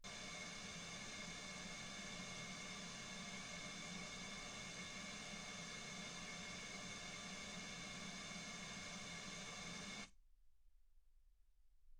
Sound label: wgn